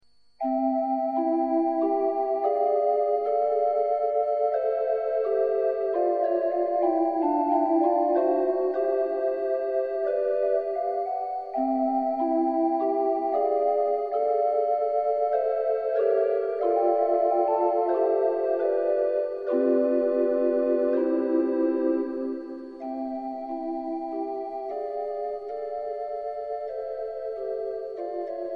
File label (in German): Adagio fur Glasharmonika; Mozart